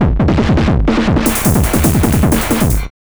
TH 909 160, Hard & Loud Techno; USB Soundscan vol.01; AKAI

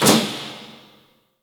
A#3, JAPANI PERC; Northstar; The Wizard VOL-1

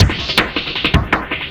FX Beat, Drum N Bass; Sonic Foundry (Sony Creative Software); Knutrix Drum & Bass

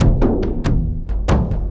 Boong Khaga Boong Ahgaga, Frame Kendang Drums; Sonic Foundry (Sony Creative Software); Friendly Fire

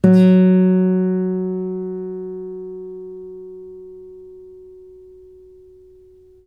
ACOUSTIC FNG F#2, Guitar; East West; Steve Stevens Guitar Samples Collection

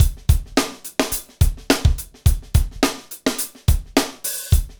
Hip Hop, Drumscapes Roland; Northstar

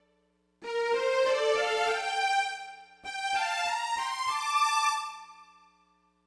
Smooth Groove Strings, 70s Analog Funk

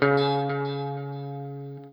NOODLE LONG, Guitar & Bass

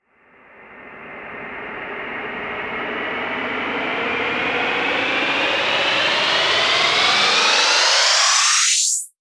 FX Sweep Up

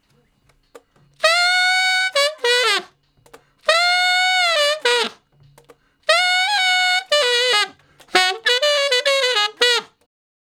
Ten Sax Straight (B), R&B; Solos

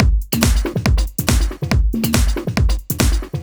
Kick Loop, 140bpm

